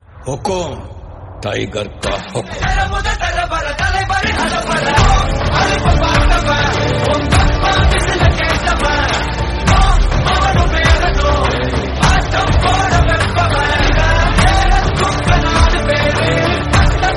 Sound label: Tamil song; jailer